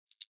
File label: open menu